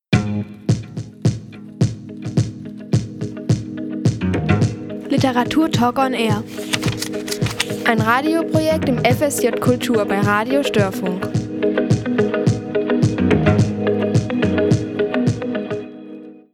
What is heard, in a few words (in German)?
literaturtalk on air jingle